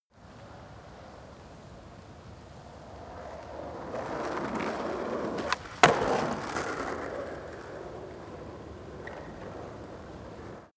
skateboard single drive by jump small

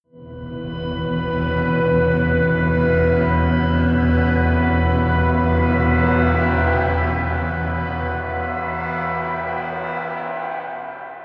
atmospheric drone pad; musikelemente; drones

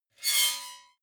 metal squeal bright fast; metall

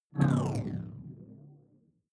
sci-fi alien big bionics down; swooshes-swishes

